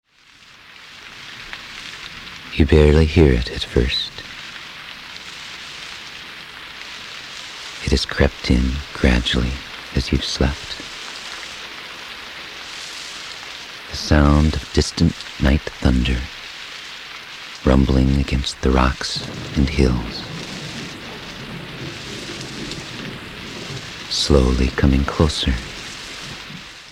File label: Rain